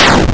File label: Hurt